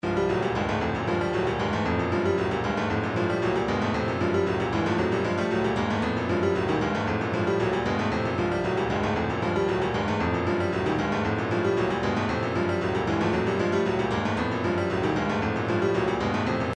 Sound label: retro funk 115, midi; floored